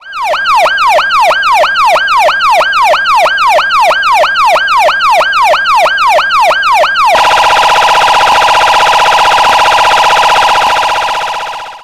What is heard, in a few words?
police ringtone